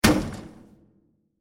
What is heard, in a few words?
Bursting Plastic With Reverb; Bursts; Impacts; Crafted Signals